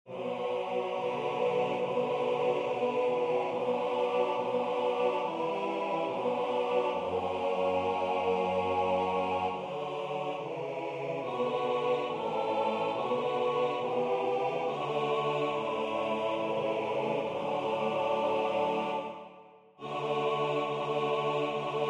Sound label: lute book lullaby